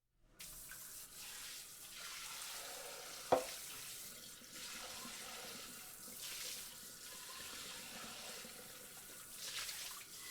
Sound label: spray dishes